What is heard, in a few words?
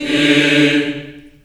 D3, Choir